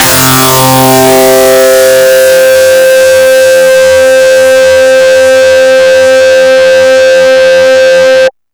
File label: DIST STACK; Big Beat Samples & More; AKAI